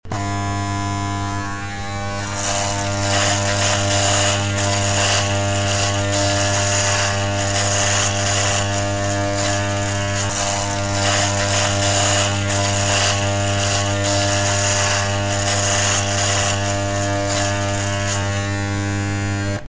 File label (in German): Rasierer; ringtones